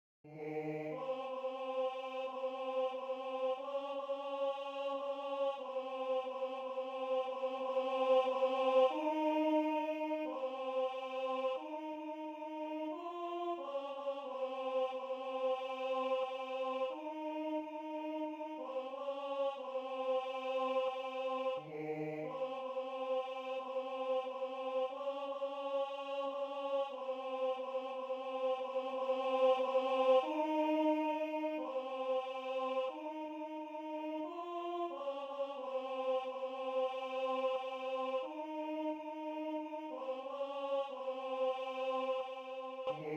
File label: Tenor I; I'm Troubled